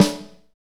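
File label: snare, Medium Rock; Northstar; Drumscapes Roland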